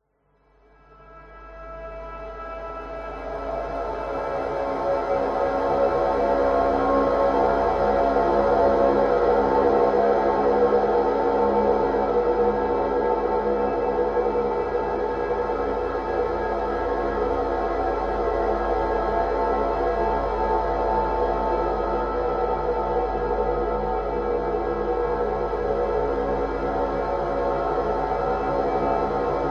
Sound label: horror drone atmos dark sinister psychotic; zapsplat